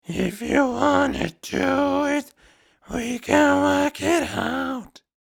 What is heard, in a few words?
growl; CVT